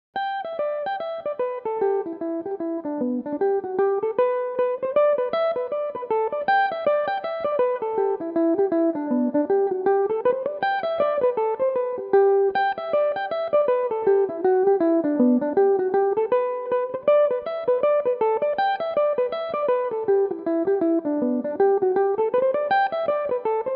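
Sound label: long drop reel G major, irish mandolin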